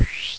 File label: portal